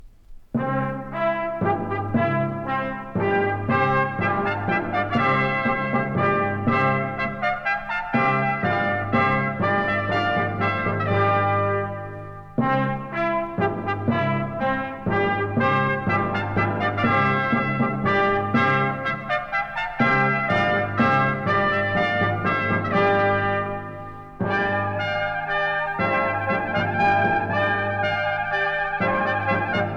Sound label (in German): Orchester und Kinderinstrumente in G Maj; Bach-Orchester Berlin, Carl Gorvin; Cassatio f r Orchester und Kinderinstrumente in G Major No. 6